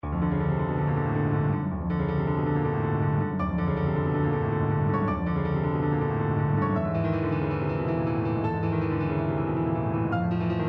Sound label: prelude in D minor